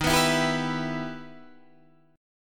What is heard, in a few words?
E-Minor-D sharp; Em/D# strummed; JGuitar